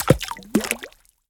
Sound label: water splash drop; bluezone; sonniss gdc